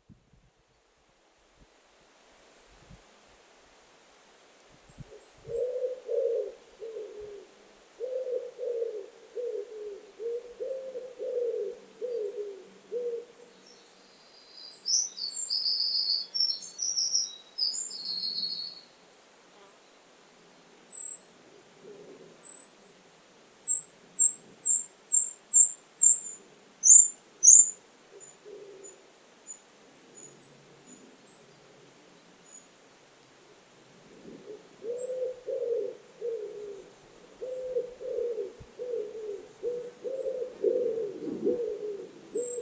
ringduva; fågelljud

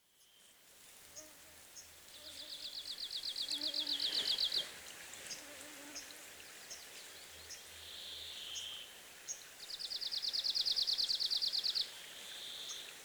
nordsangare; fågelljud

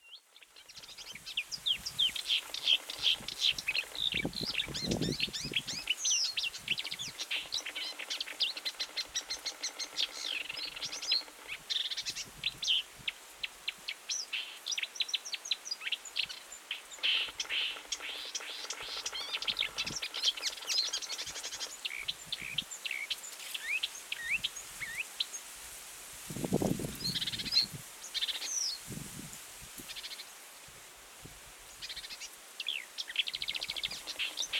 kärrsångare; fågelljud

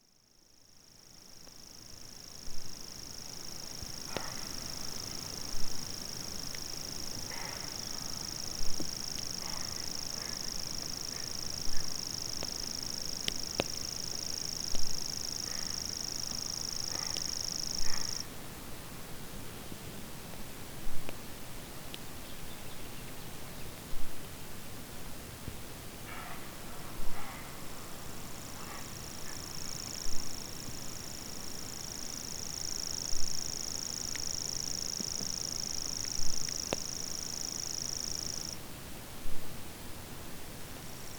grashoppsangare; fågelljud

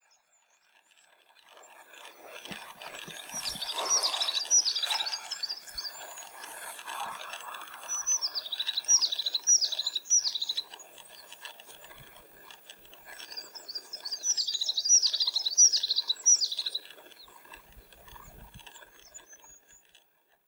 tofsmes; fågelljud

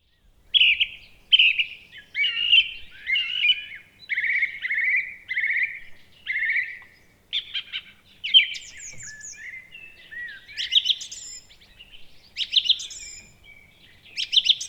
taltrast; fågelljud

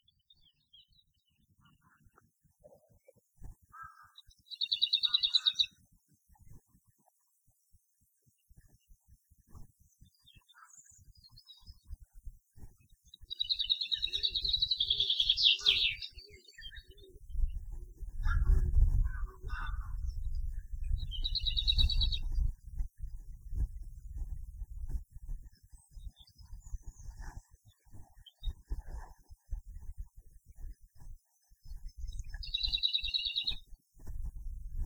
ärtsångare; fågelljud

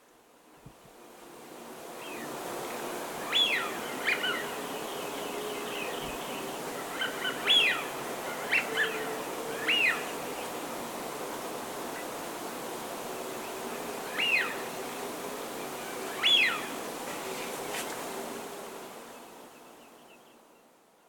blåsand; fågelljud

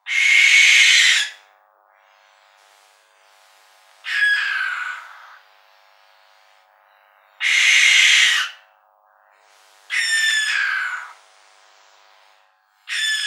tornuggla; fagelljud

